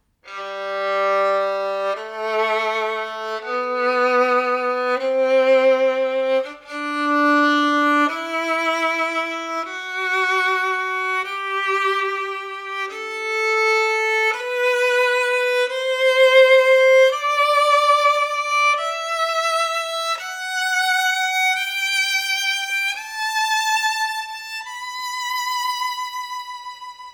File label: German violin circa 1900